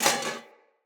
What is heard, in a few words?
Foley Armour Step G; Enemy; Mockeries